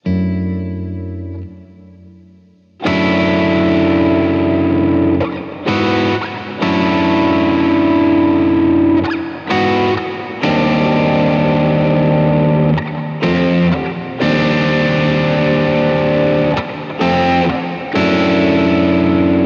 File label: guitar saturated harmony, Overdrive; Comp → Cicada → Amp → Verb